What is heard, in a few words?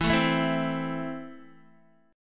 E Suspended 4th Sharp 5th, Guitar; Esus4#5 strummed; 11,12,9,9,12,x